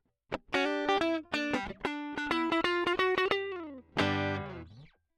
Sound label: Guit Solo Line, RNR for piano